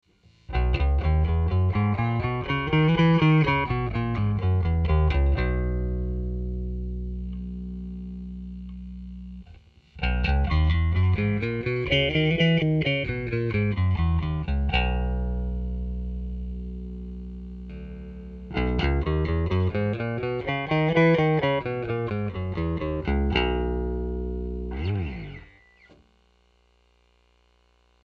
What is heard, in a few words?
bass